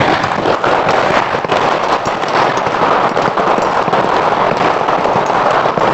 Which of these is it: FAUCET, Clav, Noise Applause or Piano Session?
Noise Applause